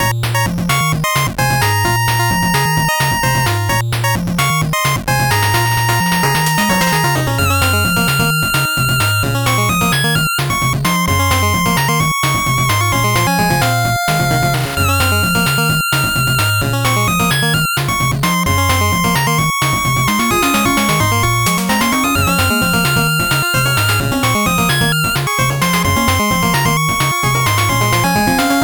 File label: 8bit bgm slow